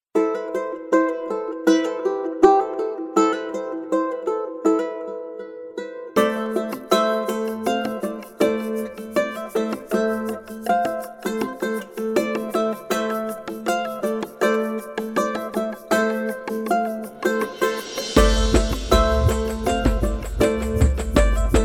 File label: World String Loops Ronroco